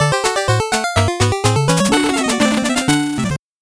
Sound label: 8bit jingle normal